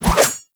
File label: Pole Arm Spin Whoosh